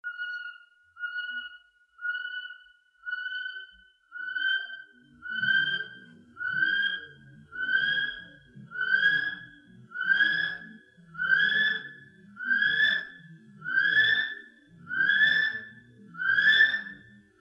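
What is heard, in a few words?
male north island brown kiwi; conservation, native animals, birds, kiwi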